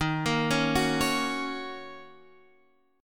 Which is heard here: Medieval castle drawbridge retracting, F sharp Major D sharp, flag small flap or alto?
F sharp Major D sharp